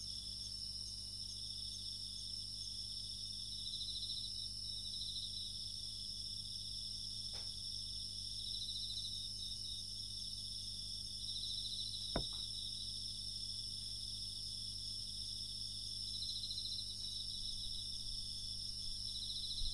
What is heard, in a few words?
insect